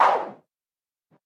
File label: bullet impact woosh